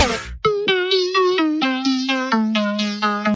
Weird Sms Tone

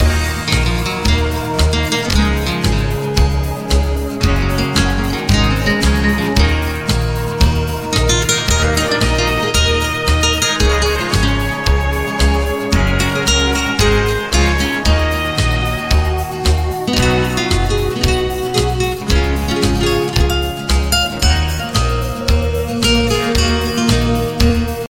Cowboy music

